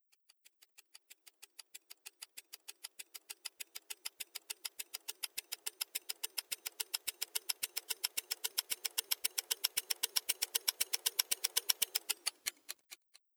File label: Bid Timer; Interface; gamerip